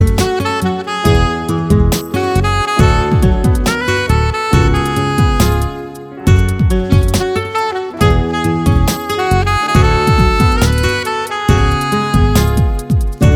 saksofon

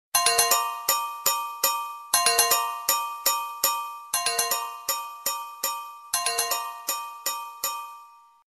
standartnoe sms na sony xperia

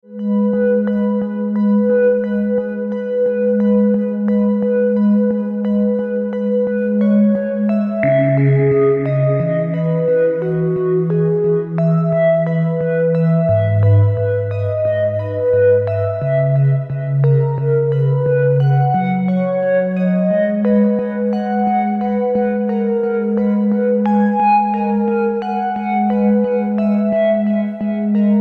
spokojnaa krasivaa melodia

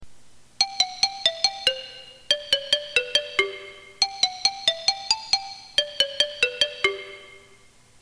standartnoe sms na apple iphone 5

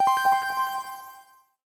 standartnoe sms soobsenie na htc desire u

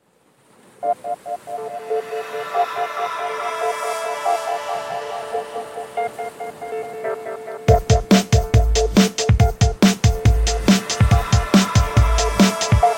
standartnoe sms soobsenie na blackberry z10

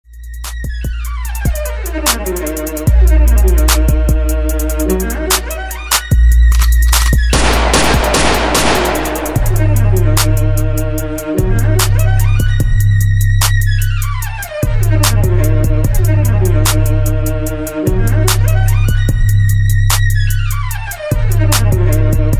gangsta skripka

